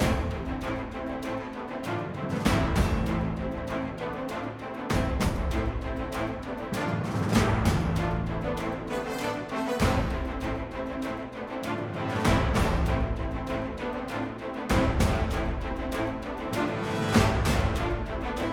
Combat Orchestral Variation Loop; Fantasy Music; Fantasy Sounds Bundle; BONUS - Fantasy Music Pack

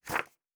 Book Page; Fantasy Sounds Bundle

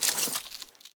Weapons, Bow, Grab Arrow, Drag, Pull, Bag Rustle; DeltaVR; FMOD; Objects Pickable

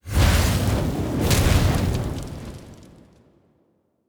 Fire Spell, Magic Sounds; Fantasy Sounds Bundle

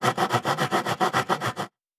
Saw Wood; Fantasy Sounds Bundle; Craft

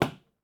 Nail; Fantasy Sounds Bundle; Craft

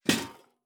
Armour UI; Fantasy Sounds Bundle

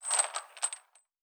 Chain; Fantasy Sounds Bundle; Craft

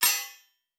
Blacksmith; Fantasy Sounds Bundle; Craft

